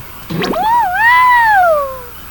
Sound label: puhuuh